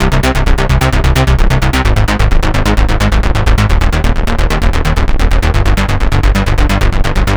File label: Bassline Trance